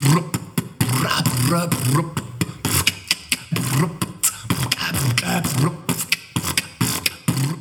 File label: beatbox 16 beats